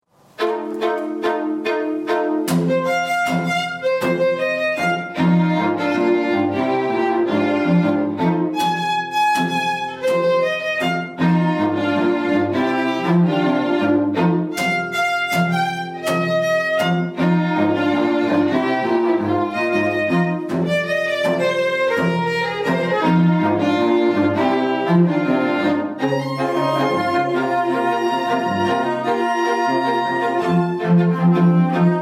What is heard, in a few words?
strings